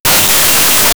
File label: destruction